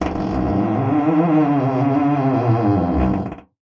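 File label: door creak large, squeaks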